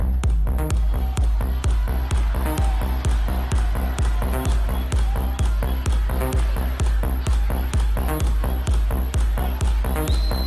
hard rock

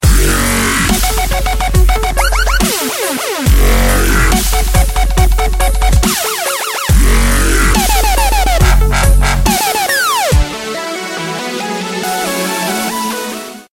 dubstep electro

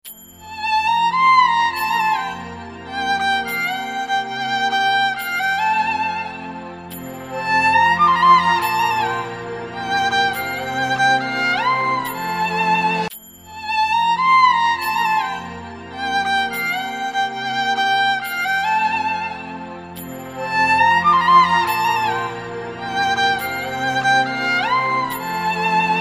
violin

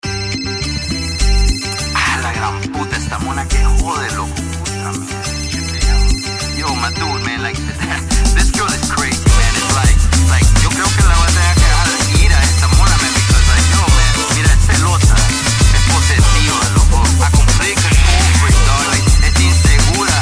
cumbia

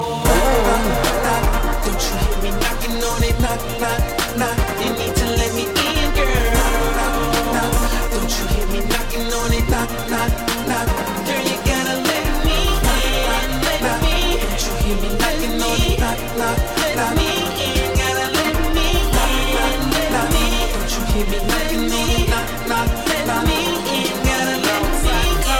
knock knock

